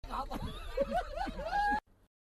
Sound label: loop